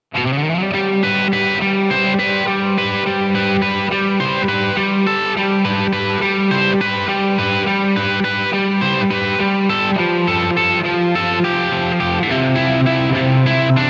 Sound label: Atmospheric Crunch; Riverside; Iridium; Cloudburst